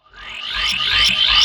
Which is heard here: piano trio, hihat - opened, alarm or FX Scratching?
FX Scratching